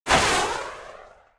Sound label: Small Rocket